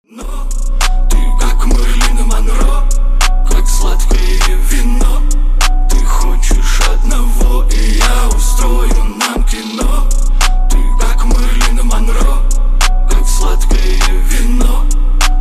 low bass

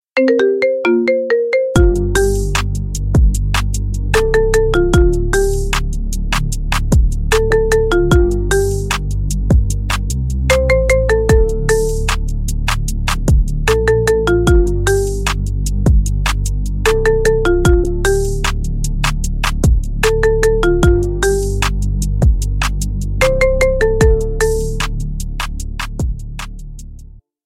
marimba remix

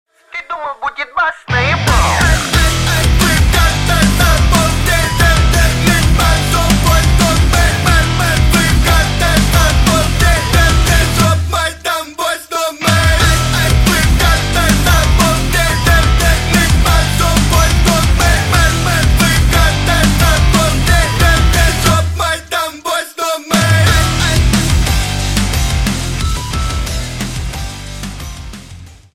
metal cover

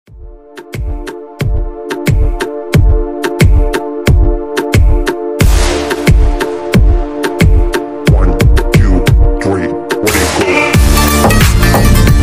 tanbur zurna

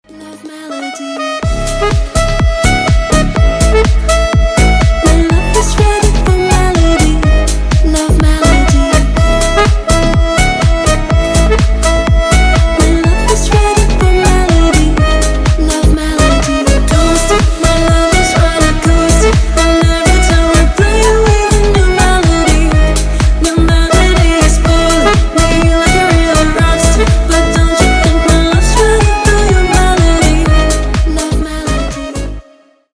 organ love melody

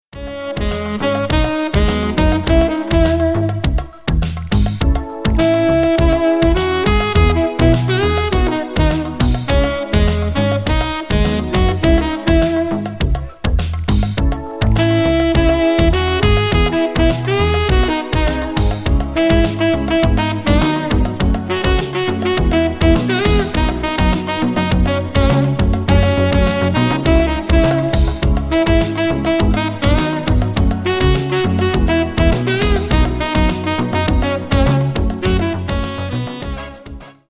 saxophone

